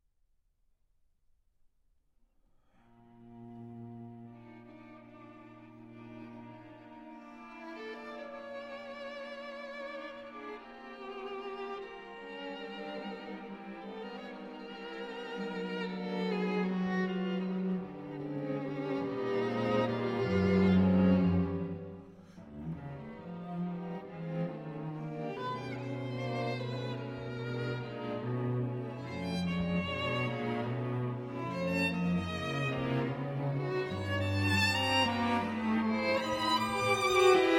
String Quartet in A Minor, Moderato

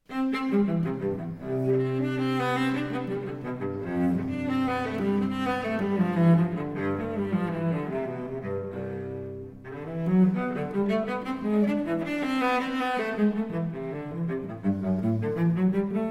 Cello Suite No. 3 in C Major, Courante; BWV 1009

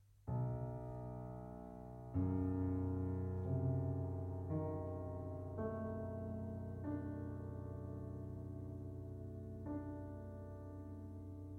Piano Sonata, Adagio Molto Appassionato

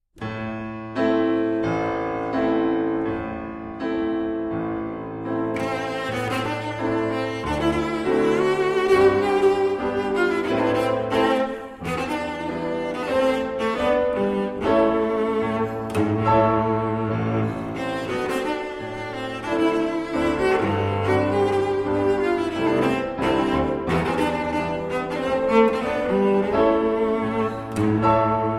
Romanian Folk Dances, Stick Dance; Six Romanian Folk Dances, BB 68, Sz. 56; Arr. Székely Transcr. Maisky

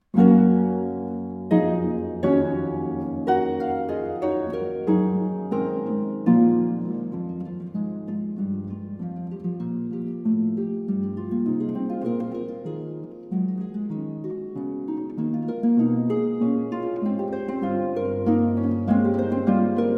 Sonata For Harp, Mäßig Schnell

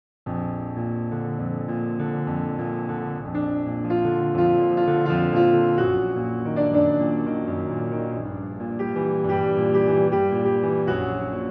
Solo Piano; 6 Songs, Op. 48 No. 6, a Dream

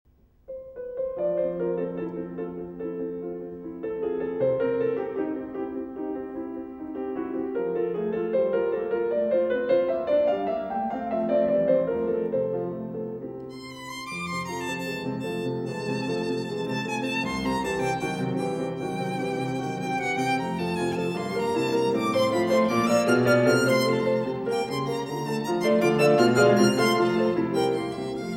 Sonata in F Major for Violin and Piano

